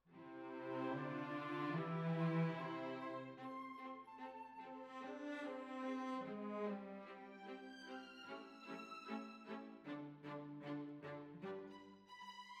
Cellos Over

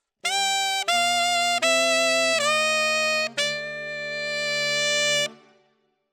Alto, RNR for piano

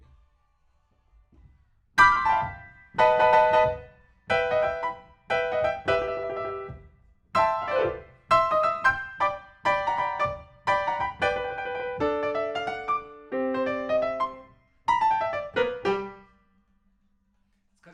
PIANO, RNR for piano

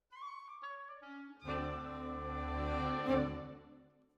Violas, jazz for piano

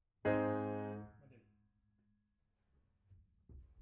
Piano B

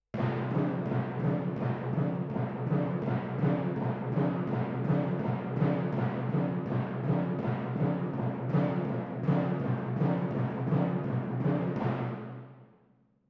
Timp

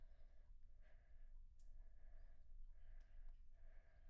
Children Choir; Studio Bekol Children Choir

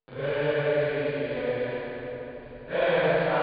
Choir

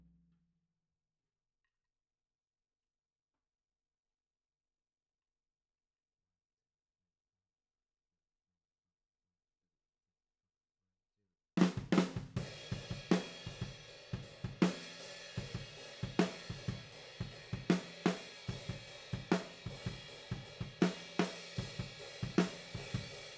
OH A

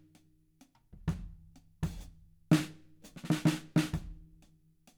Kit

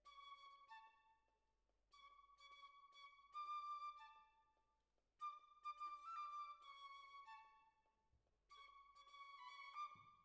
Clarinet

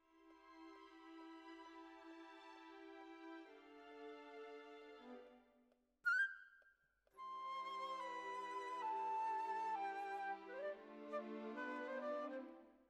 Oboe

